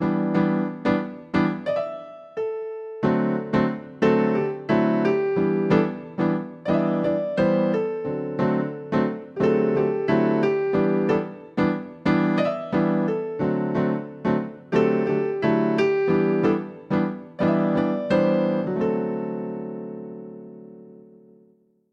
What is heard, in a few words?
Jazz Interlude